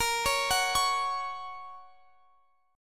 Gb Major Bb

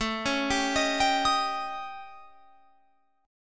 A 7th Flat 5th A, Guitar Open F; A7b5; arpeggiated

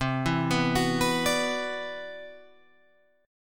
C-Major Sharp 11th, Guitar Open G

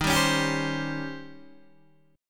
Eb 13th, Guitar, Open D

